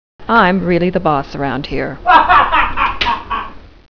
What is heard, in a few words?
kit